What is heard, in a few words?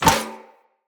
Sphere Sword And Board Catch; Weapons; Swords